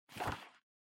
Calendar Noise

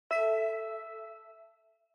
OS Sound (Speaker-Notification)

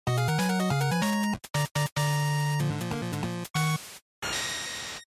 NES Action Start

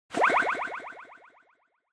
Motion Agility Low Delay; モーション 俊敏; 低 ディレイ

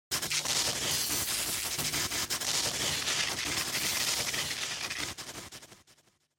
Electric Shock, Mid

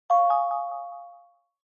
Smartphone UI Sound Speaker

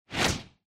Motion Grab Dry

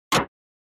Spotlight

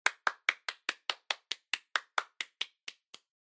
Applause, Random Fast

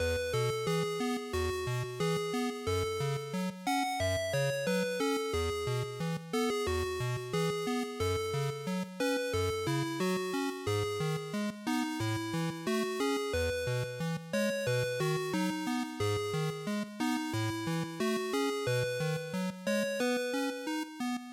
GB Fighting Story1 Loop90

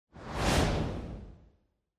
Camera Motion Dry; シーン・カメラモーション; ドライ

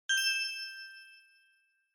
Bell Accent High

